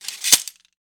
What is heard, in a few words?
sword draw